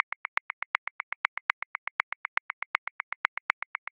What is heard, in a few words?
Perc Claves Accent 16ths; Grooves; Percussion; Ableton Live 12 Lite; Core Library